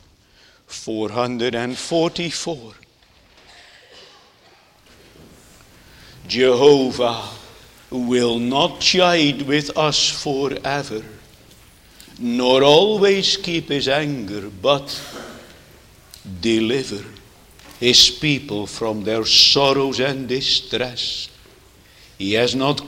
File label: Singing; Jesus' Mercy to One Taken with Palsy (2)